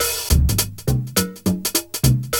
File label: loop, Roland; Sound & Vision - Gigapack I